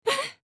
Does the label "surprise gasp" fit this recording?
Yes